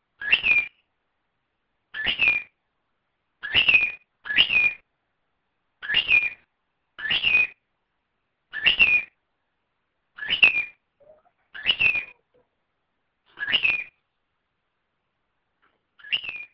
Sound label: Peep, bird